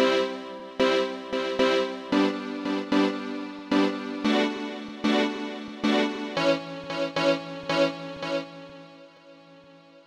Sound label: chord hits, 113BPM; Pony Fan Music Remix Stems